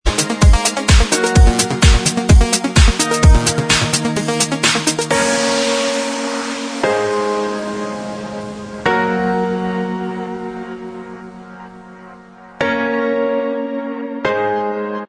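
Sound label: dance remix, instrumental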